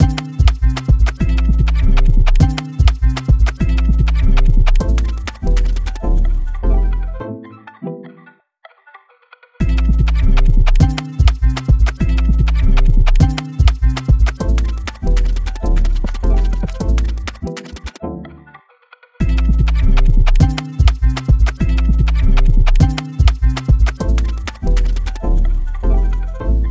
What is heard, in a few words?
Ringtone